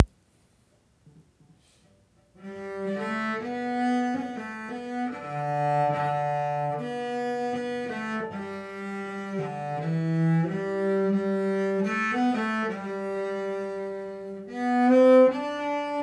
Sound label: Cello; Roddy McCorley